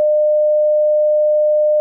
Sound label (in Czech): sinus 603Hz; KMITÁNÍ A VLNĚNÍ; hudebni nastroje; CHORDOFONY; virtins